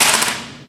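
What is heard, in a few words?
physics clipboard impact small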